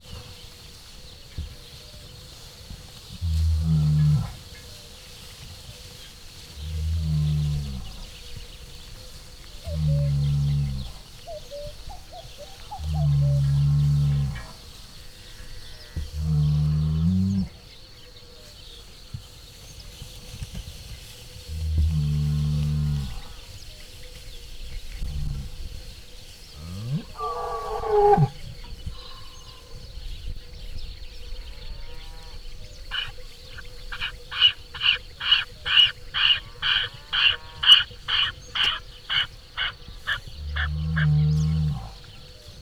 folyamatosan őstulok bika kétszer kulán; Hortobágyi Nemzeti Park

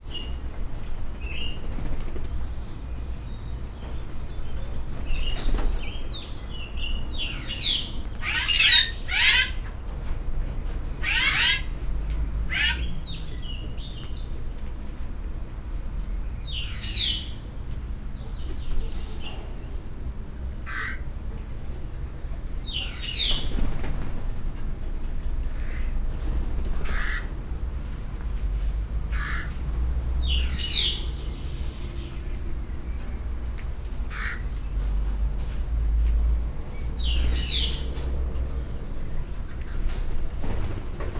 ropkodnek es halkan csivitelnek, egyszinukiralycsoricse; szegedi zoo 2011

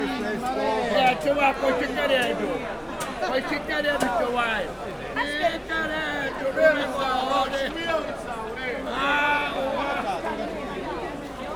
hangoskodó piaci kereskedők

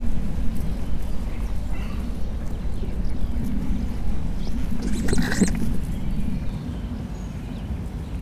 halkan szél, vörös vári; akg; allathangok; veszpremizoo 2013

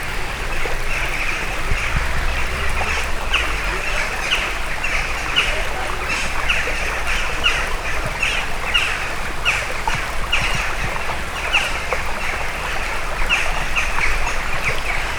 csókák patakkal

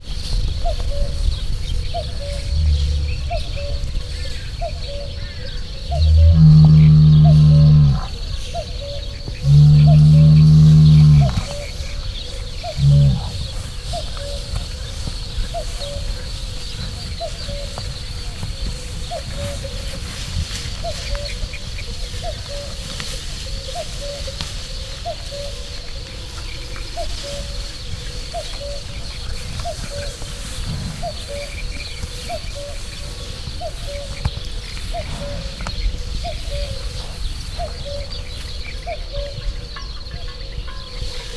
bőgő őstulok bika, kakukk, széltől mozgó növényzet, puszta; Hortobágyi Nemzeti Park

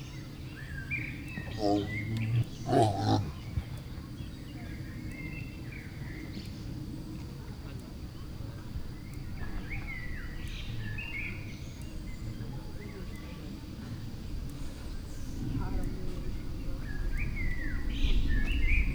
kétszer, oroszlán; allathangok; veszpremizoo 2013

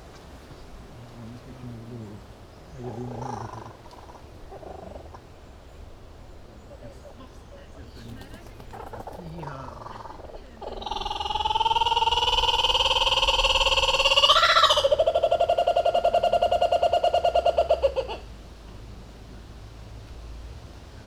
balról jobbra átrepül és kacag szél, kookaburra kacagó jancsi; allathangok; veszpremizoo 2013

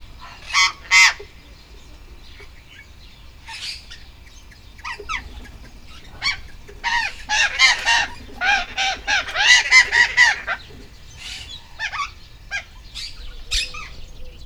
miniatur, sziklapapagaj; debreceni zoo 2012; marantz puska

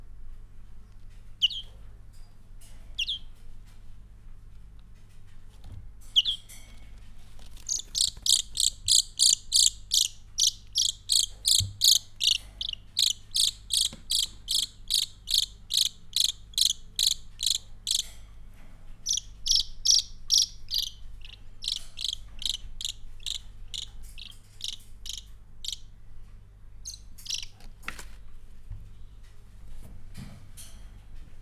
zöld szín ualabam, zöldike; jaszberenyi zoo 2015

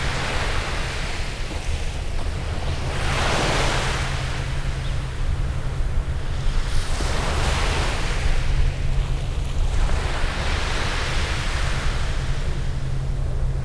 lagy hullamok halk motorossal

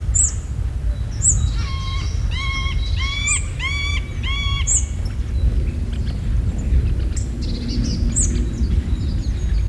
a him tavaszi hangja, kozep fakopancs; Visegrádi-hegység

